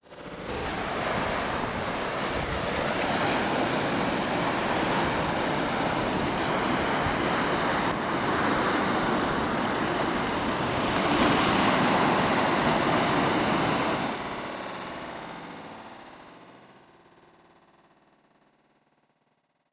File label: Beach BGM